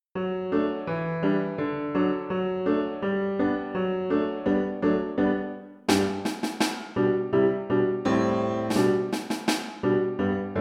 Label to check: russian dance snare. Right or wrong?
Right